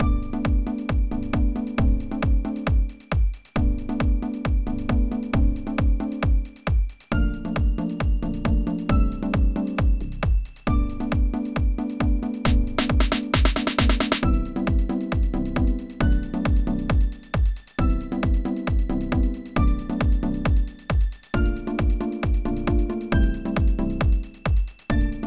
Disco Room; Hello Kitty Big City Dreams; NDS

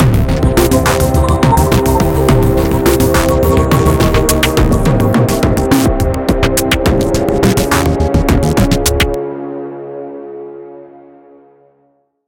Fight Low Combo; Remember Me

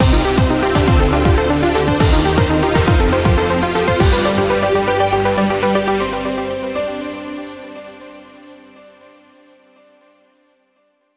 Stage Completed, Stage Clear; gunlord dreamcast

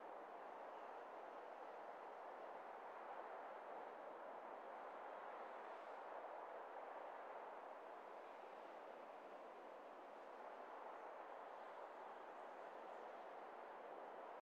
Clouds; Boomerang Fu

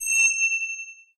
Block Melee Ring; Boomerang Fu

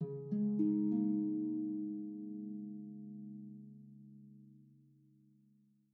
Calm Harp; Super Mario Maker 2

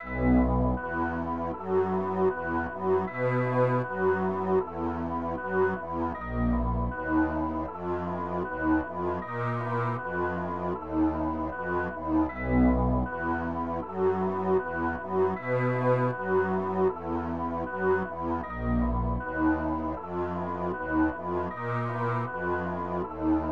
Conversation

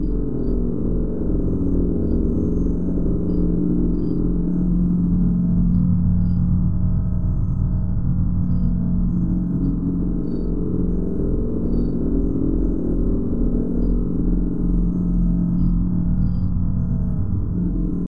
Menu Animus Pad; Assassin's Creed Bloodlines; psp

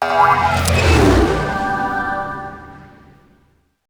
Unlock themed level; littlebigplanet 2 ps3 gamerip 2011

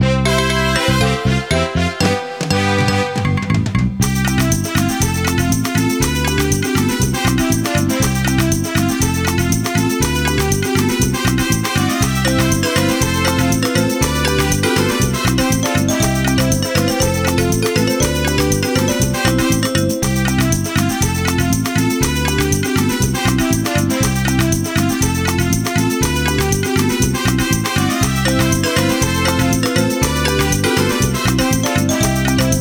Minigame Win; Naruto Shippuden Ultimate Ninja 3

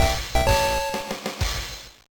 jingle; Road Trip: Shifting Gears; GBA

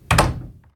Door Close; The 39 Steps

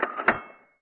Currency Midas Flyoff

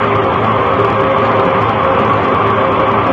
walk; guns n zombies 2014 pc; valkiry

